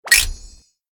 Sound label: receive evidence